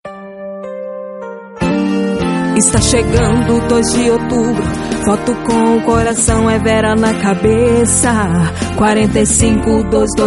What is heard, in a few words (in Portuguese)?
Jingle politico